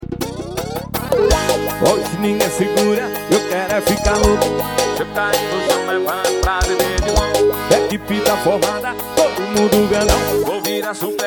rave e piseiro

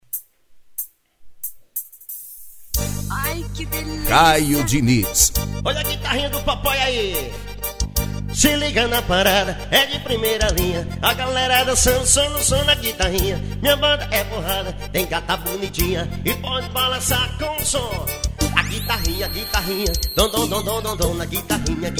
guitarrinha

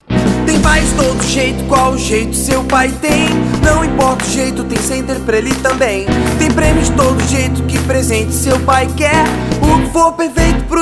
jingle; center pais

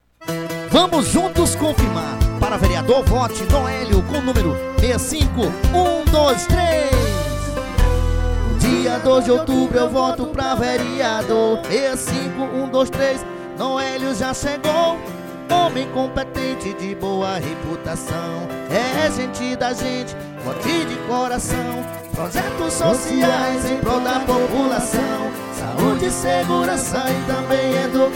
jingle

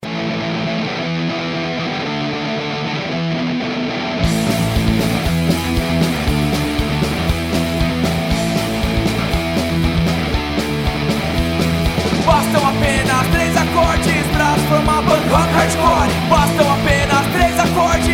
3 acordes

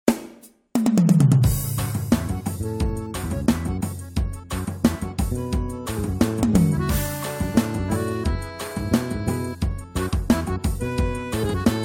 xote

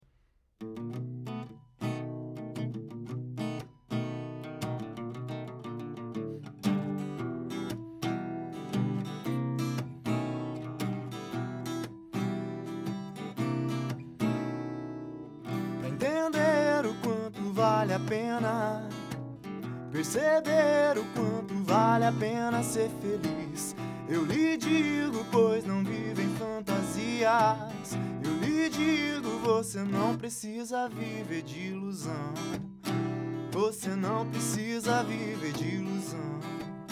blues

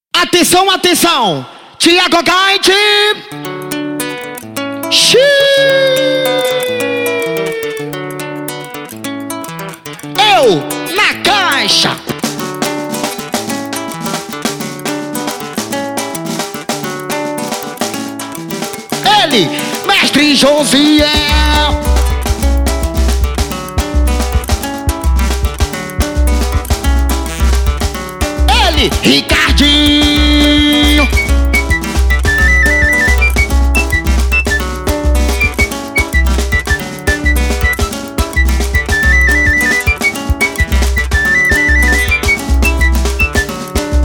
samba

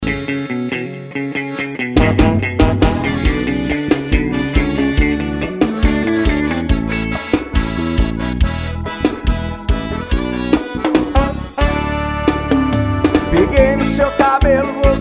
forro; akambada

